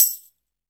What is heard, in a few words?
TAMBOURIN; AKAI S-Series CD-ROM Sound Library VOL-5